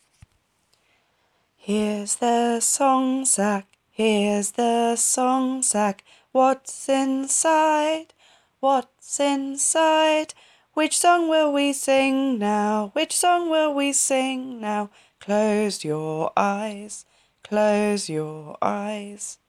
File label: song sack